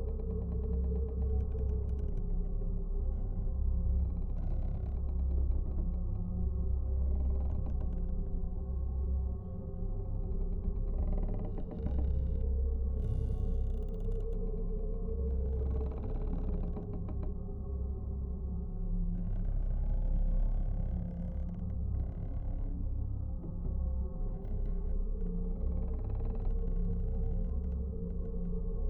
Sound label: haunted cabin, ambiance